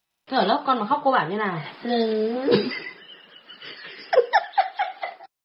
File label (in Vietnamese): Meme Ở lớp khóc, Cô giáo bảo Nínnn; Thế ở lớp con mà khóc thì cô bảo như thế nào? Nín....; Bản gốc